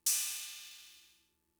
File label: Cymbal, Fricke MFB-522, Drum Machines